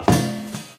metal grate, footsteps

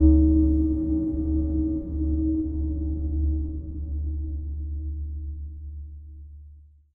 editor blips; Source Engine; Portal 2

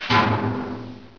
bust metal, debris; Source Engine; dod

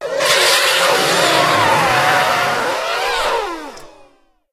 suit deflate; video game; die; ceda; Left 4 Dead 2; Source Engine; infected

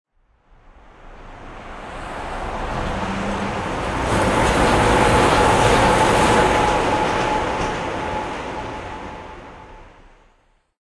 train 1shot, harbor; Source Engine; tf; cp harbor

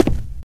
wood, footsteps, walk; source engine; left 4 dead 2

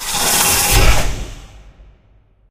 elevator door open

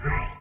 item default pickup; Source Engine; Portal 2

